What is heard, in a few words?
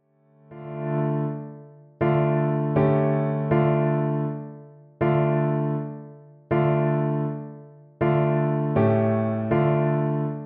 tambourin; j ph rameau